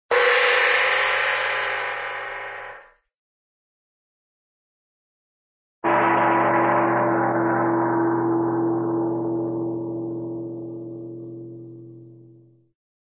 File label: magic light gong hit; cartoon